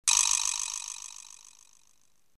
doiiinnnggg

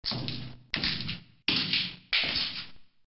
footsteps on floorboards

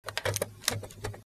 phone receiver

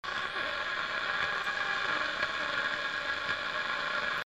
radio white noise

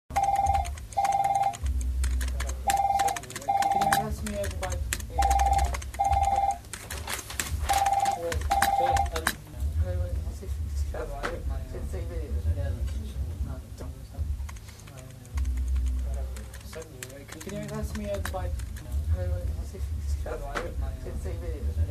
office

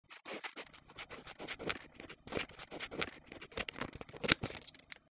paper cut

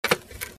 phone lifted

